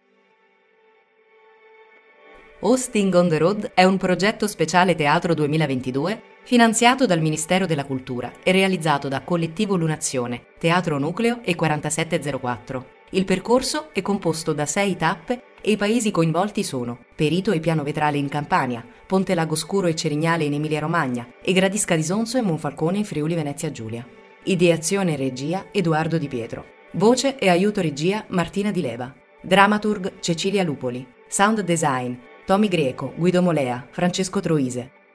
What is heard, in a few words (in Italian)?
Piano